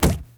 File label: rumble death hit